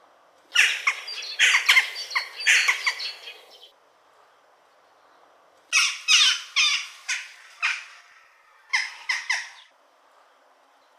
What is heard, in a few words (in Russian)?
vesennie kriki galok